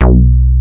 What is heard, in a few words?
Moog Bass Hit